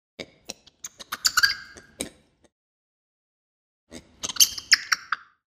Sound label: Macaco flav defensive, lemur; Eulemur macaco flavifrons; The Audible Phylogeny of Lemurs